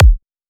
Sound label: kick